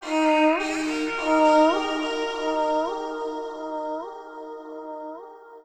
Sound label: VIOLIN FX